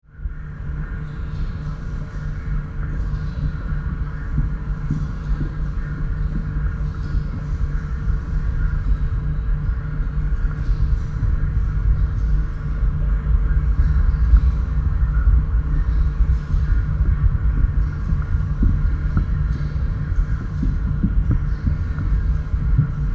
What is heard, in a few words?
Ground Box sound